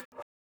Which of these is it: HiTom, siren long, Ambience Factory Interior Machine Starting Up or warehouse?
HiTom